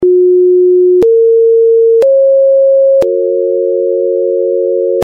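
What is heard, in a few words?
F Sharp equal temperament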